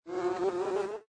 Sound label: Bee; yet another pixel dungeon android